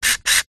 science fiction robot motor server movements

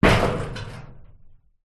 impact metal loose corrugated gate impact

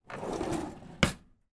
household drawer cutlery kitchen close

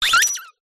sparkly short glitch; PMSFX

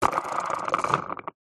leisure toy car wheel spin freely air

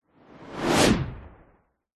sound design whoosh fast swoop; zapsplat

